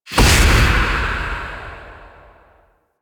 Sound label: Reaper Soul Harvest Target Hit; Warframe; Wraith